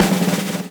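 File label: drum roll; E-Lab; X Static Goldmine 2; akai; ROLLS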